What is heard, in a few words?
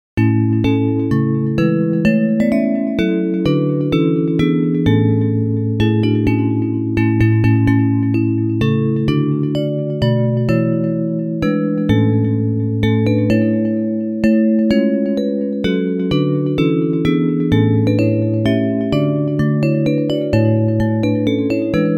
Bells, Traditional hymn